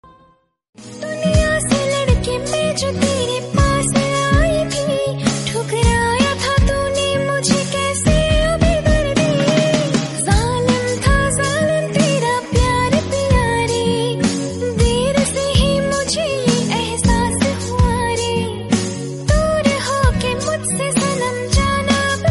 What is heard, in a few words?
female version ringtone